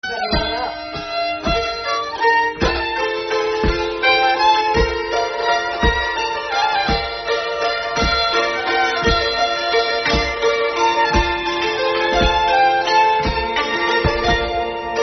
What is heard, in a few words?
Waltz A D; Nameless Waltz